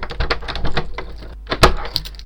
turn key

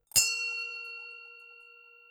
save bell low

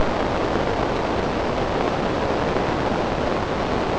waterfall

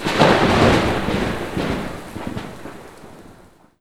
thunder

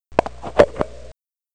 flip

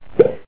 pop

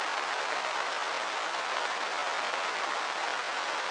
rain

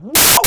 fall

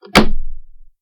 switch

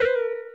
trampoline

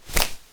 splat

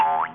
big jump

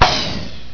upgrade

